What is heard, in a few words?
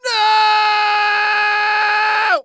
scream